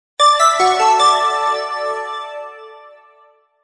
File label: bell